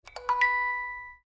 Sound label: SMS alert, Sony Ericsson M600i